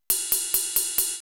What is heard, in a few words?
CYMBOL